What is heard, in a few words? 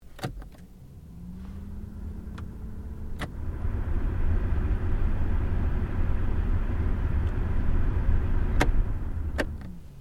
Car Ford Focus Aircon Fan Operating; Ford Focus hire car; Vehicles; Cars